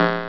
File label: Ball Snd; ST-XX Amiga Soundtracker Sample Packs